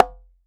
CONGA CL SLP; AKAI S6000 CD-ROM - Ultimate

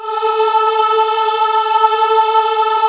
AAH, VOCAL

